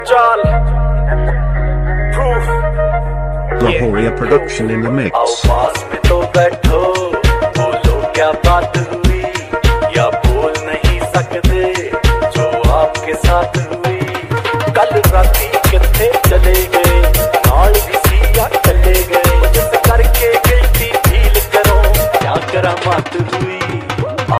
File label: dhol remix ringtone